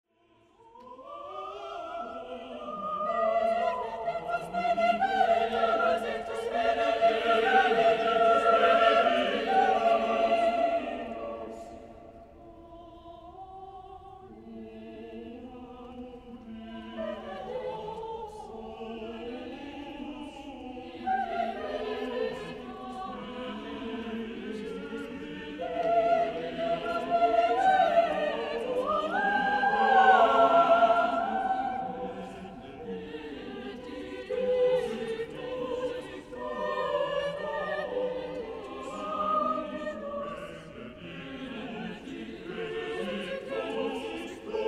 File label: choir